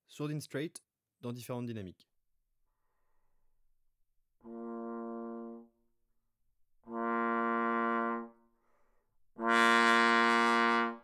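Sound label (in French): sourdine straight sur différents dynamiques, trombone; Sourdines fixees; traite orchestration; trombone tenor trombone basse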